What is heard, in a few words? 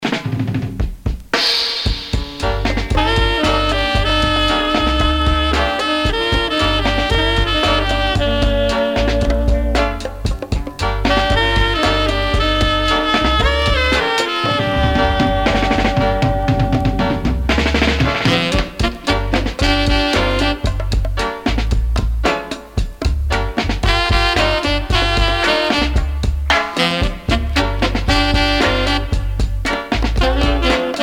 horns